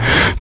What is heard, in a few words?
vox, Human Perc; Spectrasonics; Supreme Beats; World Dance